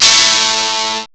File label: fuzz guitar; GUITARS1